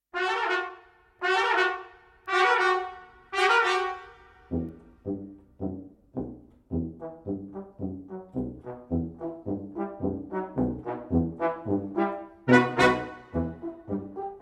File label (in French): Les pompiers version instrumentale